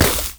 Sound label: nashor attack oh; LoL; monsters